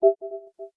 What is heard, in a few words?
voice eos; Samsung Galaxy S8; Bixby (Korean)